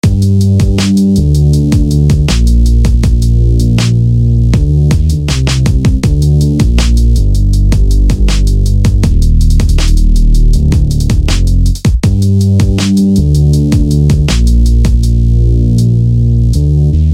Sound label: Bass Buzz; SFB FX